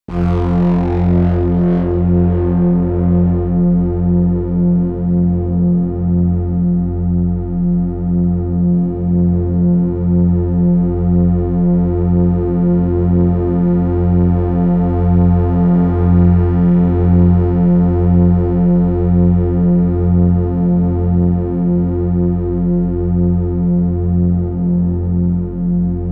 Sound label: Moog Drone